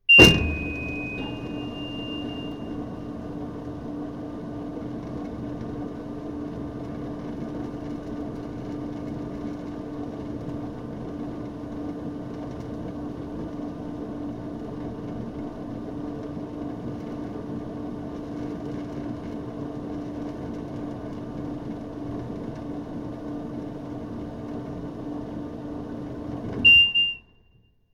Industrial Mechanical Electrical Motor Agriculture Potato Box Filler On Run Stop; Tom Hutchings